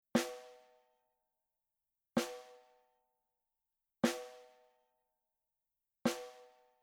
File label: SNARE Edge Soft; evans; GeneraHD; naciagi do werbla uderzany